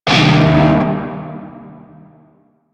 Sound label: Shawzin Min Chord A; Warframe; Narmer Shawzin